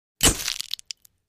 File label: Bulb Flash Mix Glass, flash pops